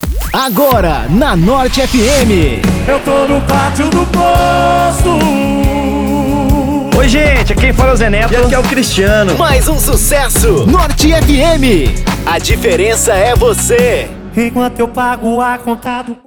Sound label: intro music power